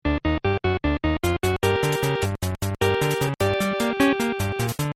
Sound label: song; Wreck-It Ralph